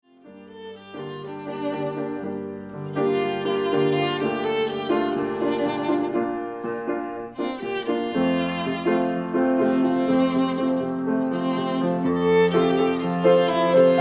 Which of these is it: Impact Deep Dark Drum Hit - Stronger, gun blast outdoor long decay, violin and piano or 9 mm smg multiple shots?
violin and piano